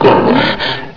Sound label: pain; quake2; komickat